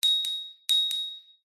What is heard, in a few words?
end ping timer